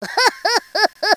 acertou; GEOGRAFIA

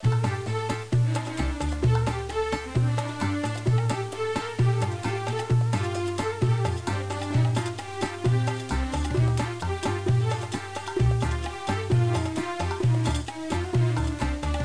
trilha; GEOGRAFIA

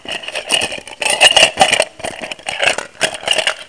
bolso; 7invasores

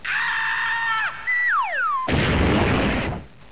bigorna

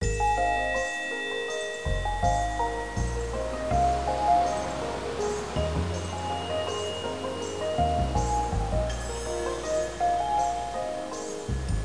trilha; GEOGRAFIA

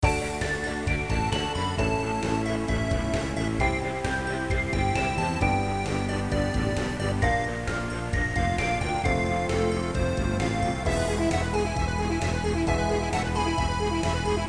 trilha; GEOGRAFIA

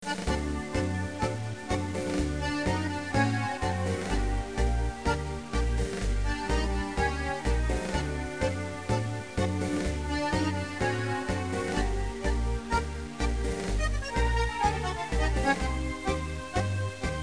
trilha; GEOGRAFIA

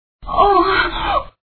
gemido

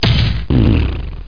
bexig; GEOGRAFIA

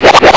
amarra; 7invasores

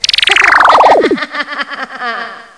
fura; GEOGRAFIA

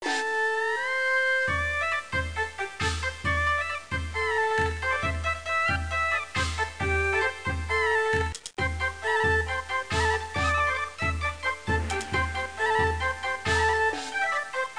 trilha; GEOGRAFIA

